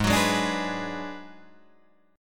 G sharp Minor 7th Flat 5th G sharp down Guitar Drop D